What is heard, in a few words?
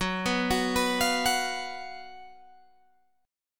Gb Major 7th Suspended 4th, down, Guitar, Open G